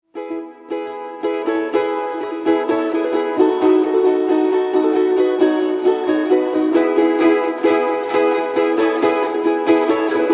наигрыш на трех балалайках; Подружка

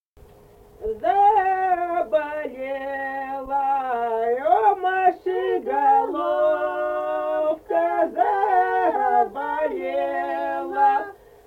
Заболела у Маши головка, Язовая

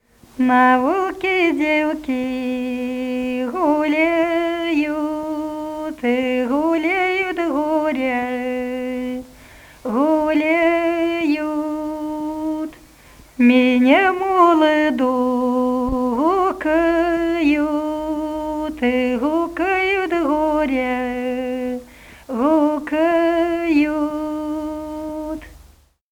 На улке девки гуляют (апрельская)

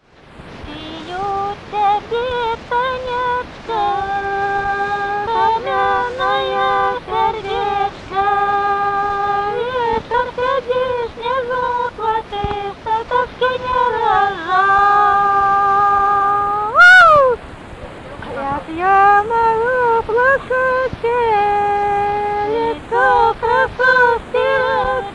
Ти у тебе, Танечка (свадьбишная); Соловьёвка

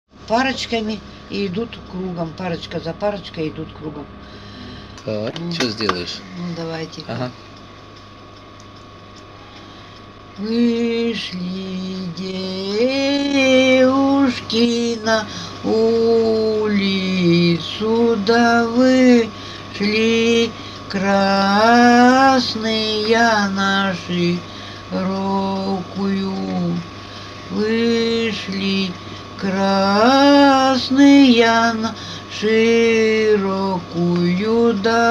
Вышли девушки на улицу; Чарышское

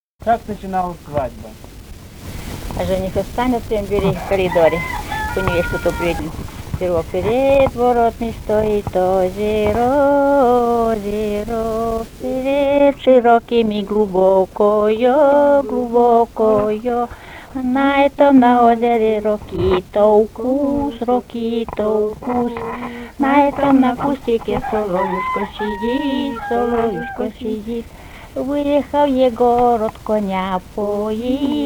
Перед воротами стоит озеро (свадебная), Усть-Вотча

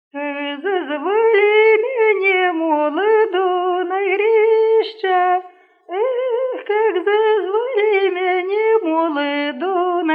хороводная; Как зазвали меня, молоду

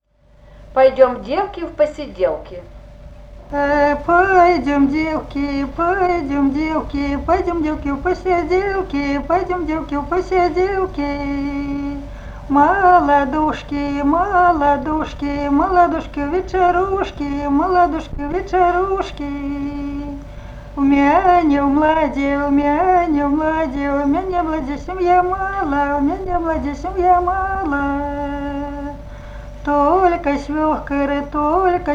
А пойдём, девки, в посиделки (колядная)

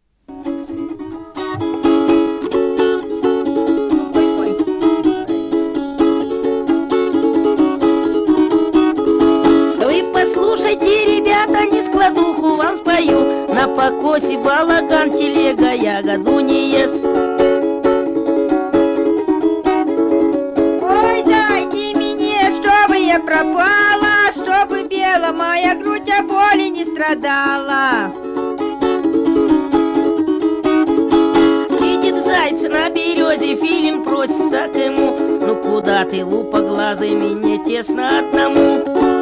разнострой; Вы послушайте, ребята; Табора; Чарышское, Тулата

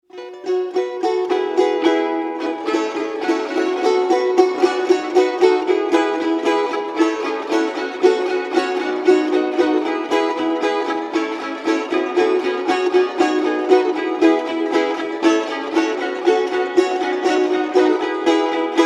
Дуся (наигрыш на трех балалайках)

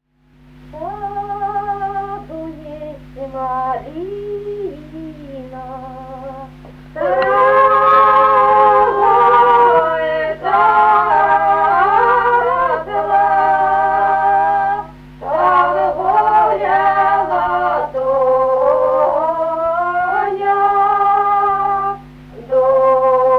лирическая; Доброе; В саду есть малина

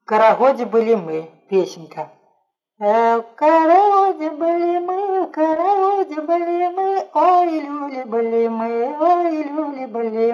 В карагоде были мы колядная хороводная

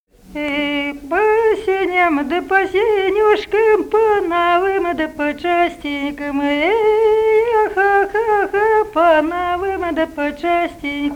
плясовая; Эй, по сеням, да по сенюшкам

